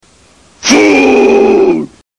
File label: doof